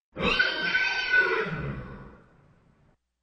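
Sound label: neighing in the stall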